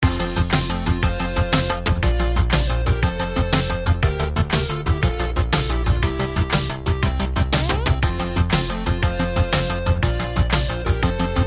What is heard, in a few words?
Techno Bubbles